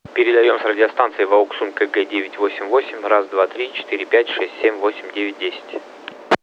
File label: wouxun kg 988 tx